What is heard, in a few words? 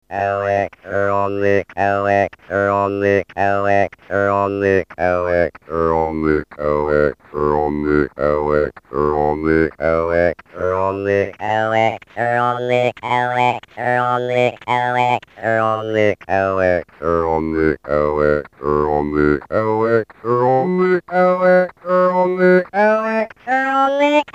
pitch shifter